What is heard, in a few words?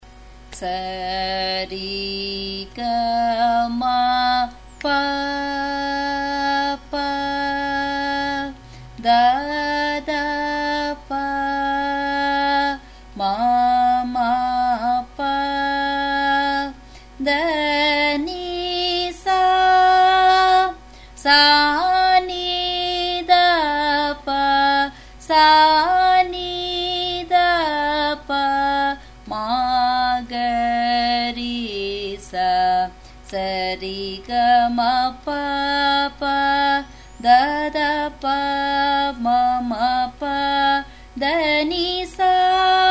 Sarali Varise, F sharp